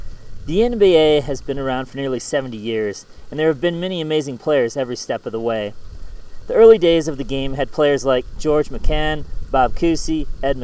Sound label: outside with wind and waterfall